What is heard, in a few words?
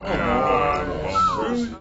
crowd awwwwww; Gutterball 3